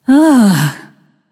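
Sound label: nonverbal sigh; smite; voicepack; izanami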